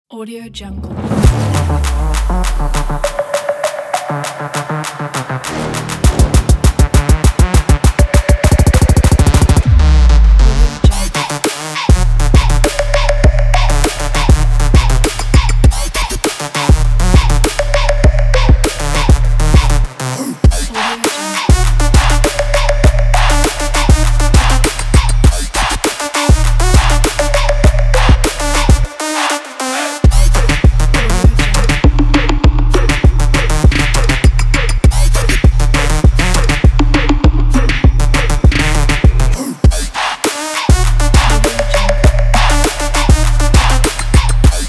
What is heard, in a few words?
twerk